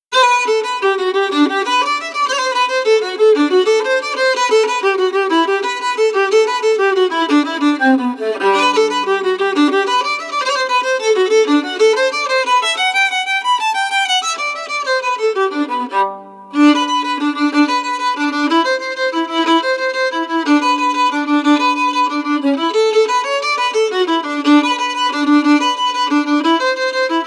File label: IRISH CELTIC GIG